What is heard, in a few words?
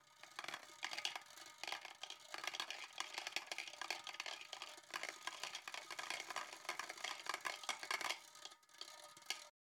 Rolling Roulette Ball